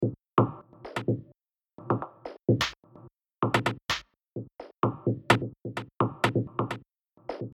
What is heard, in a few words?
Perc, 128